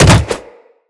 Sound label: Jump Attack, Footsteps; Wall Run; Characters; Tenno